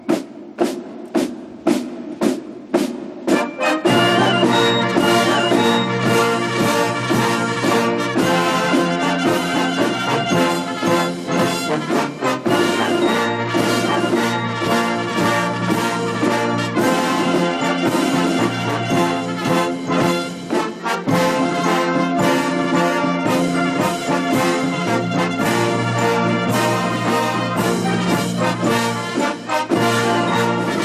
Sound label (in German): zapfenstreich, national music